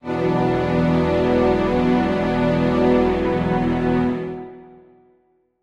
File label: Strings start